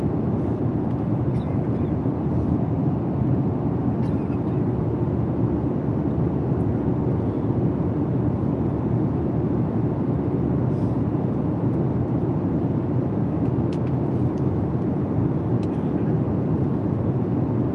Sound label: ambience airplane onboard boeing 752 flight mid flight engine; ua125 ewr txl